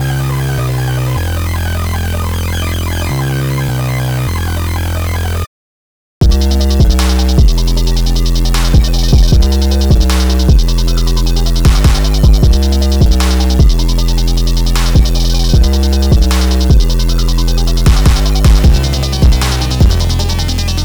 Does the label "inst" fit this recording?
Yes